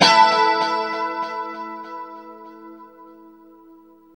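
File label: chord A 4, guitars; Spectrasonics; Hans Zimmer Guitars VOL-1; JC-SHEEN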